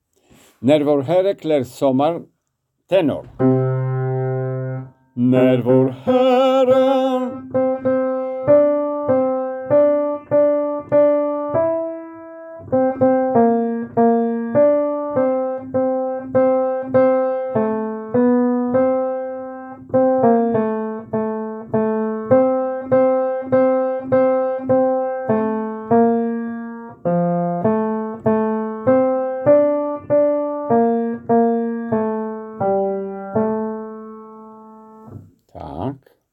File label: tenor